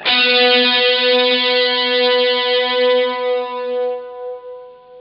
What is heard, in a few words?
GUITAR, POWER GT